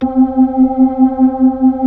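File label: B-3, Hammond B-3 organ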